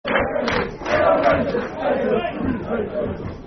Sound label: We Are The Rangers Haters Football Chant (Soccer Song); Brentford